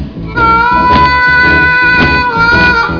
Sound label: blues wail